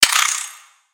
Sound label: Spider Die; creatures mechanical; Lego